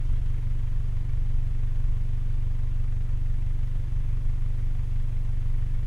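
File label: Nissan Terrano II 2.7 TDi Exhaust - Onboard - idle loop mono; Soundholder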